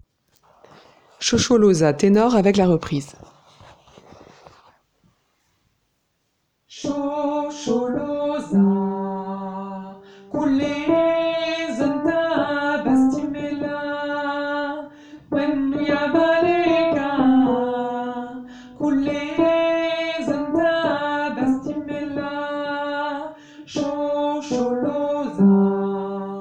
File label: Shosholoza tenor; Traditionnel Sud Africain